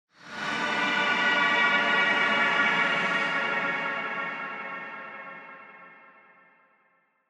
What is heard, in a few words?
Horror Accent High